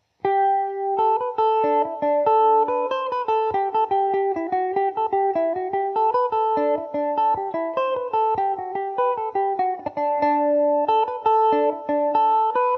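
jackie roches favourite D mixolydian mandobird, mandolin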